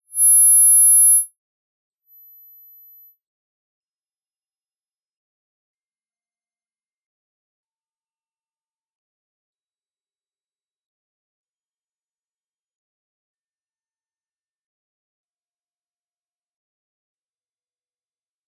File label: 11 to 20 khz